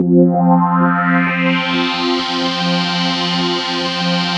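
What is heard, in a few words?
JP8 filter sweep, Jupiter 8; Big Fish Audio - First Call Vintage Keyboards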